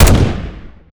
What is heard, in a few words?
arc fire; STALKER